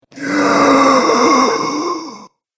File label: Zombie Exhausted; horror; zomby